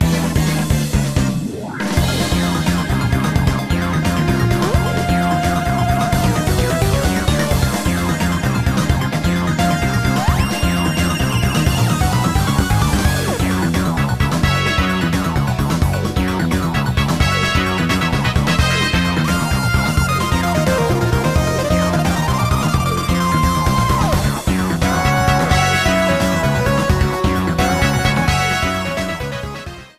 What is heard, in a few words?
Fever theme; NSMBW; Coin World